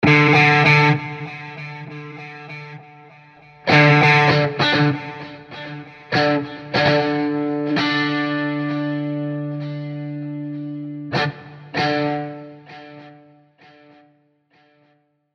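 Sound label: Guitars Drones Phrases reverse; Scoring Guitars 2; Performance Palettes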